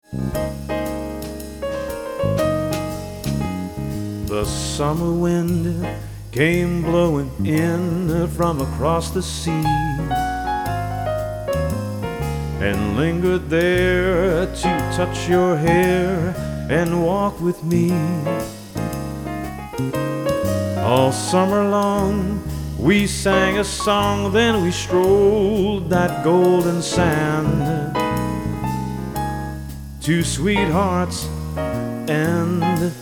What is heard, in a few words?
summer wind fade